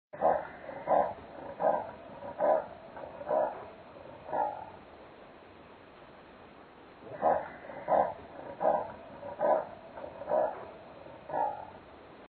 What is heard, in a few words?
Leopard